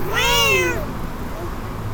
cat meowing

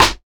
sharp acoustic snare sound g key; snare drum samples

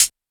soft sounding hi hat g sharp key

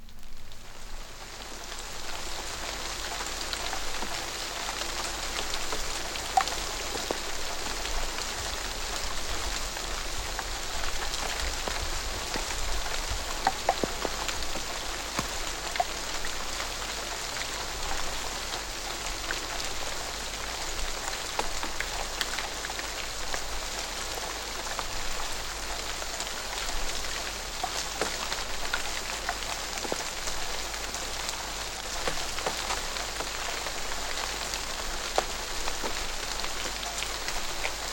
rain horror - vinyl

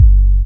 subby bass drum single hit g key

